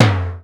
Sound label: smooth tom drum single hit f sharp key; tom drum samples